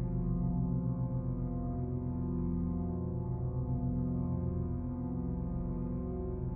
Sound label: Ambient Wait; Game rip; MiSide